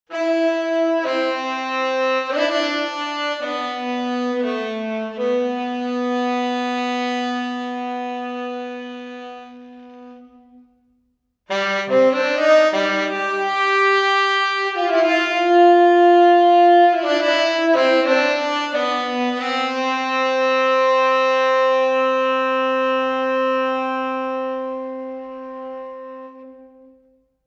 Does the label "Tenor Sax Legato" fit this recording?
Yes